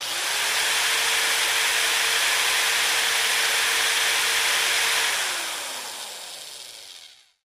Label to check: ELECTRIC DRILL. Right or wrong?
Right